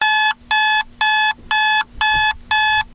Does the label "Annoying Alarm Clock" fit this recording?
Yes